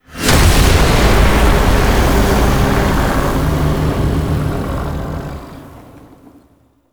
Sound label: Death Stinger; Enemies; Warframe; Entrati Doppelganger